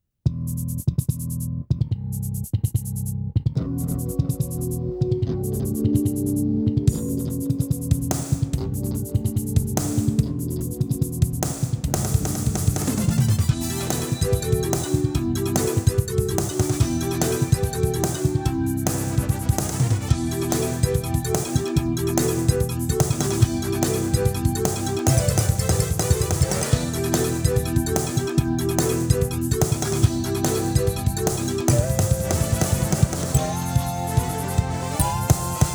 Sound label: Opening (no vocals); final fight cd scd